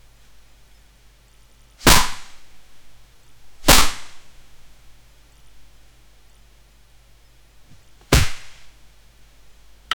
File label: super hero fight with pun